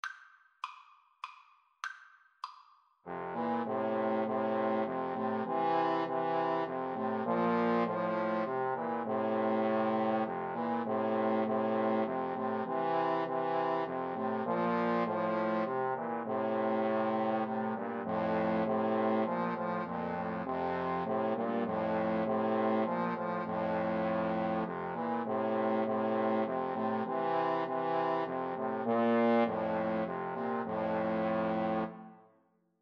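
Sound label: come thou fount 3 trombone karaoke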